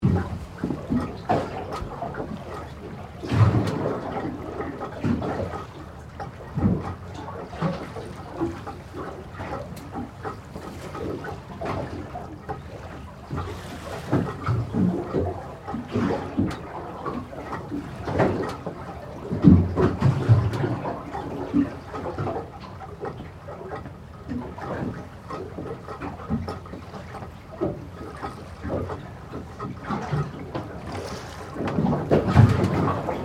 Boat Float Bow Perspective, Water, Splashes, Engines, Off, Loop; Transportation, Watercraft; Police, Marine, Division, Cherokee; BlastWave